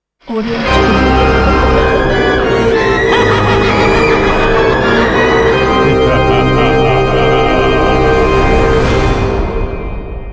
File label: scary intro logo